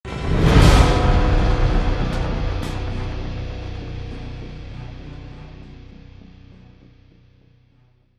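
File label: sting, drums; transformers war for cybertron gamerip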